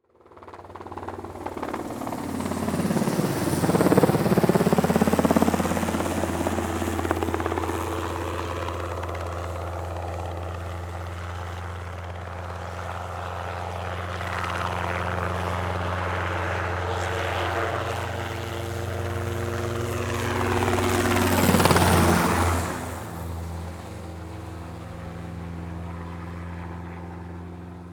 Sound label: Transportation Helicopter Fly By And Fly Back